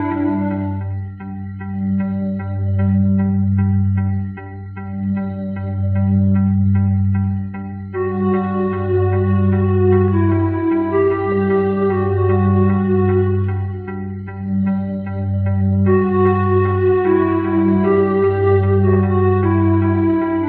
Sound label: flute and guitar, Loops, Sad